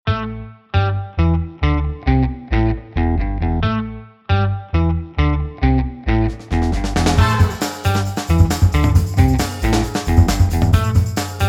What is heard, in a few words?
Funky Chase